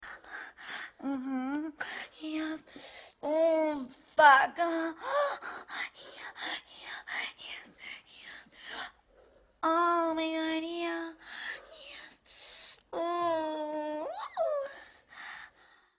moan; addams

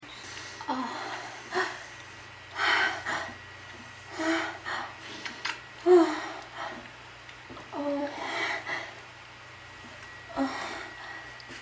moan

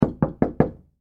door knock brothel; A succubus is born

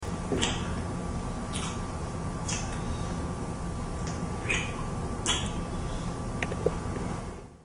kiss

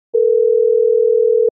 dial; Inseminator

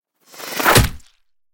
piercing shot, ranger; A succubus is born

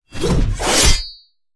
sword strike, warrior; A succubus is born; combat

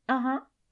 girl "mhm"; Inseminator

